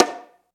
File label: High Conga Slap; Groove Elements